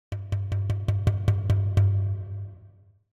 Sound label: Drums Short Drumming - Slow Hits - Lower Drum; Hollywood Edge; sound designer's toolkit v2